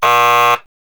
pinball buzz; peter pan pinball

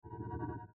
gallery Enter; starwars; episode vii the force awakens

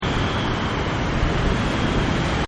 speed wind

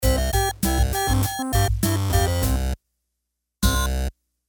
intro song; toystory; mrs potatohead

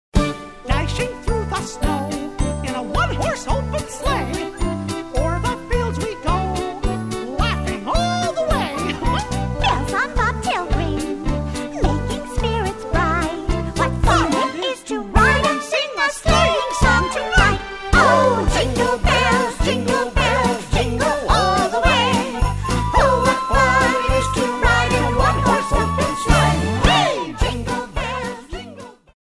vocal jingle bells; karaoke; disney; christmas favorites

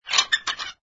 Line Squeak; conservation catapult; project green

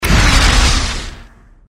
item fan; disneyxd; stuntastic

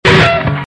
bad chord

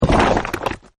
coconuts; phineas and ferb; snowbrawl

